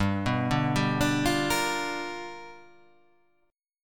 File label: G 7th Sharp 9th G chord